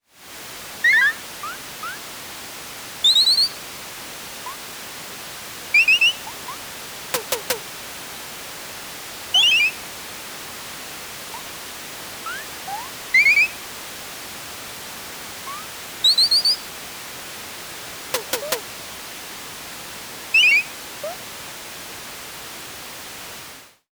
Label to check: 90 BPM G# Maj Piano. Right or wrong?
Wrong